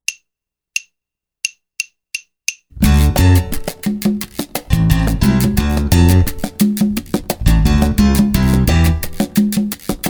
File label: Slap Book Short